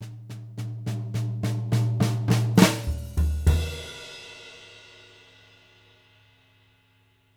JZ70 SWING, Living Drums

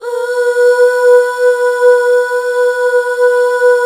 UUHH, Back Uuh Fem

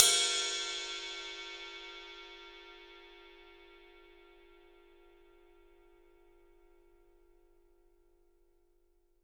ride cymbal; 3D Sound; 3D Drums

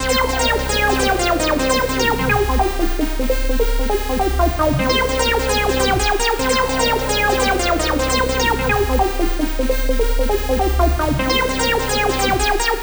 ART SWEEPER, Tekkno HOOKS; Masterbits - Tekkno Trax

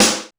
Drum Machines, Korg 01W; 300 Drum Machines; TotalKit

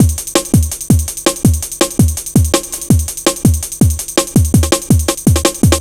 Drum Bass, Drumloops, 165bpm; Zero-G; Total Drum Bass

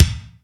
XV Kick, Roland XV-5080

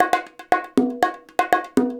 120 CONGA; Best Service Advanced Media Trax Modern Composer

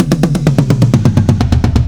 Fills 128BPM, Clubsounds

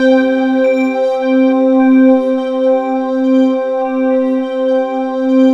bisound C3, ethereal atmospheres; Soundscan - Ethereal Atmospheres

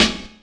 SP1200 snare; E-MU Formula 4000 Series Vol. 1; Hip Hop Nation

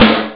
sdrum; battle; Amiga Soundtracker Sample Packs

